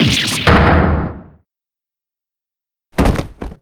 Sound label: faint; rayfa